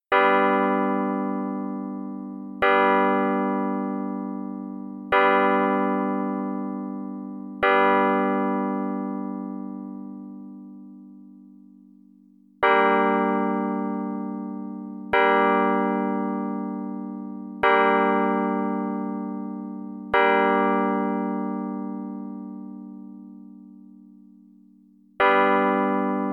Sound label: triades